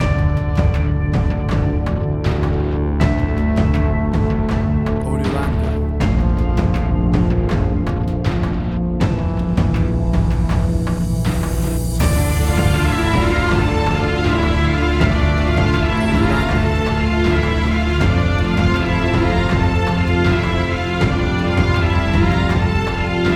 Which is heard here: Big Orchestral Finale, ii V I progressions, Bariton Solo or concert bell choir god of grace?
Big Orchestral Finale